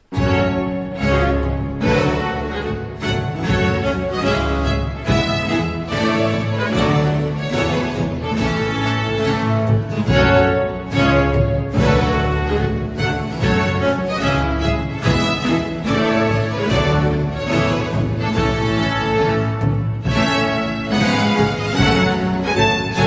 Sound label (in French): sonnerie; lully